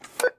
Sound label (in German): bankautomat karte; minecraft